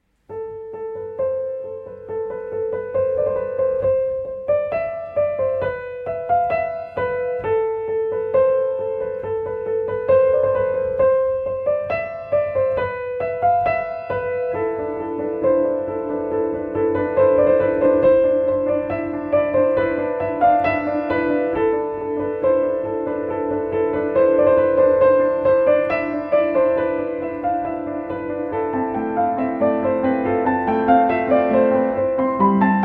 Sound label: Solo Piano Version